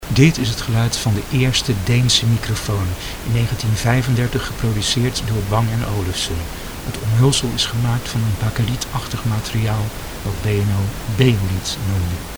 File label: B&O beolit